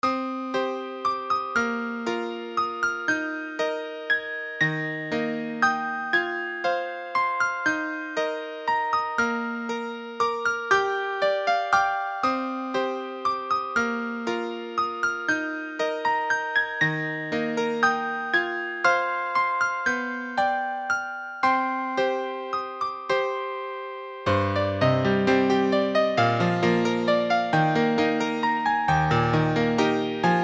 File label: showtime (piano refrain); homestuck midi covers